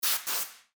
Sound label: compressed air